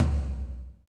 TOM TOM, Roland

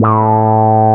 QUAC, QUACK SOLO, Synth

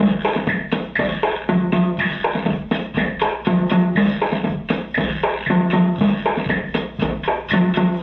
Loop, rhythmate, classic machines

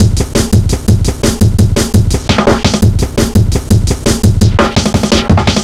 block sweep, Drumloops 170bpm; Drum Bass; Zero-G; Total Drum Bass

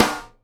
snare GLASS, Live Snares

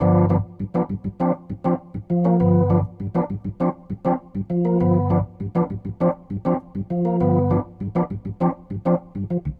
Rotor Riff, Organ

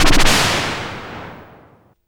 FX, Drum & Bass

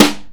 Snare, Drums

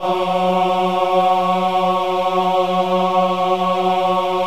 VIB F#3, VIBRATO, Voices; Spectrasonics

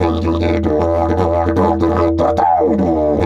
E 150, DIDGE RIFFS

